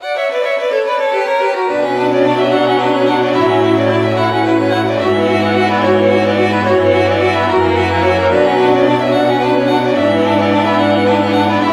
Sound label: battle theme; weeklybeats-2016